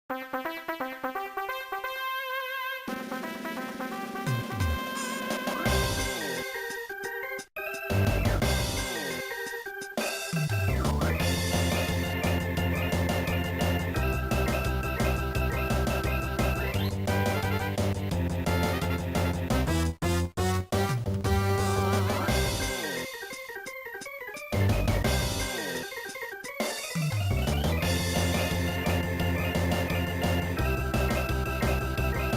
burst-in rock 'n' roll; mother 3

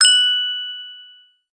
wink

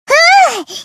"uuu"

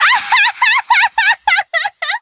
laugh

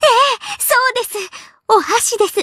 chopsticks; Erika

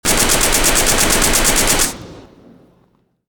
gun

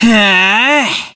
heehh; Battler

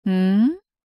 hmm; Beatrice

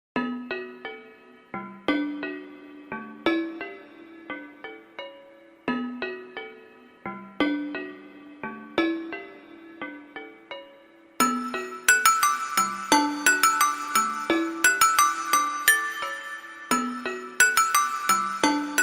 music box; higurashi kai visual novel bgm

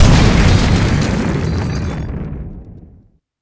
bomb

